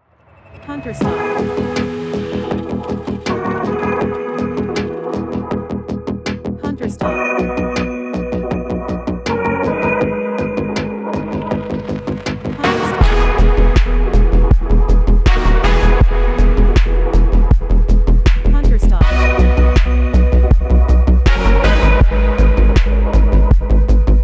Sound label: Ambient